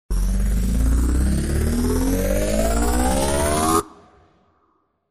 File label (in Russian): spiner revers